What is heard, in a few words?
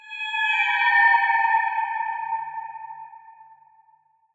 ambient abstract metal evo; Half Life Model Pack